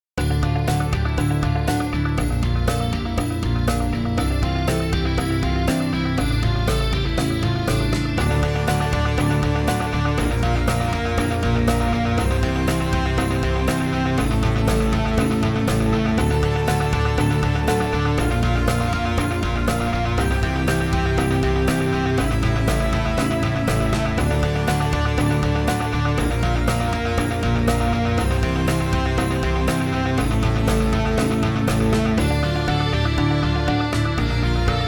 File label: radio rock